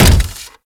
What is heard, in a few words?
Step; Warframe; Corpus; Arachnoid Wraith; Steps